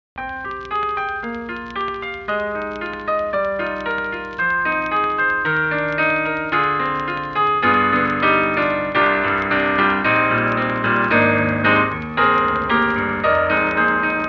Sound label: Grammophone Piano; Hybrid